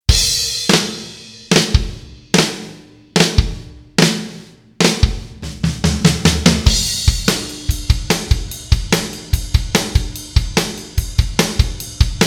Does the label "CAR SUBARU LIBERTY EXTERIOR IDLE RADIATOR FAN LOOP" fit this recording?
No